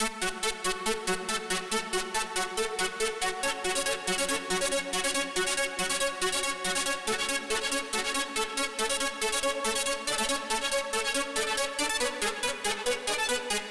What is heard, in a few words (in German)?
Marsch lead